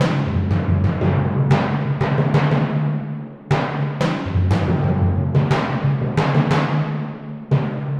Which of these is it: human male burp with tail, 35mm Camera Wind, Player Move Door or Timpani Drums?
Timpani Drums